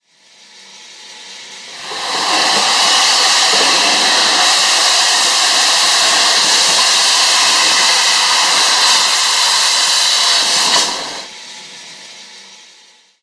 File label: Boiler Blowdown; RailPro